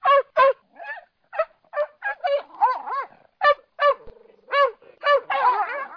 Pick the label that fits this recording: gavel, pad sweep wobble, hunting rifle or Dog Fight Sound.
Dog Fight Sound